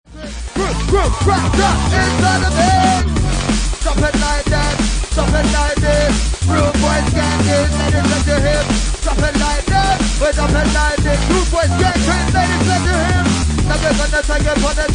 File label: DNB